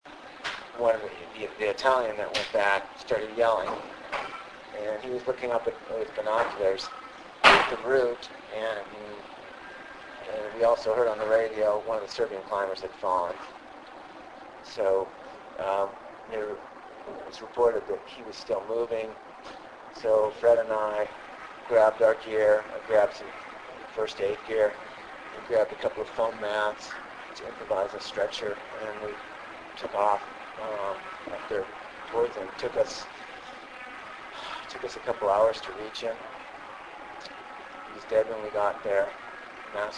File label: Serbian falls